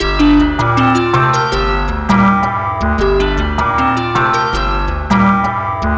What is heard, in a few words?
Bass, Synth Bass Loops